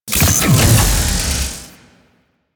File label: Shrine Assemble Frag Completed; Warframe; Duviri